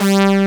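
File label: brass G1, SYNTHESIZER analog brass; AKAI S6000 CD-ROM - Volume 1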